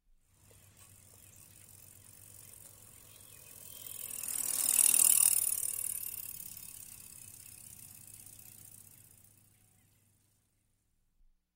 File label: bicycle